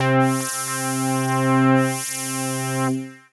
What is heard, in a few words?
Synths Note C